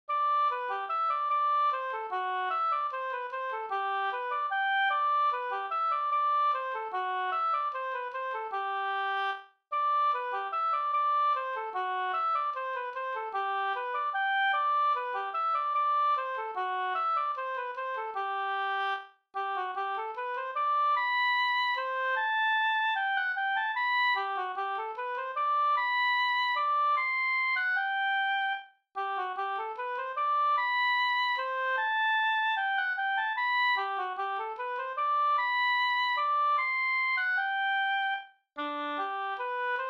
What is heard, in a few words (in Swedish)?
vals G-dur